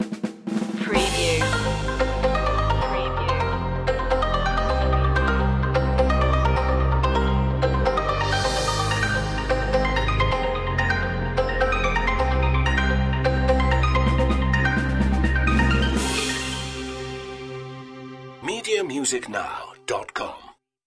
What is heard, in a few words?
liquid breaks stinger